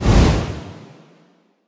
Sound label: whoosh large; Nut Boarding